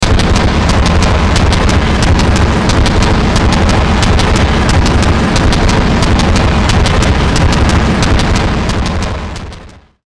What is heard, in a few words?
Distortion Pounding Rhythmic Click Hits; Blastwave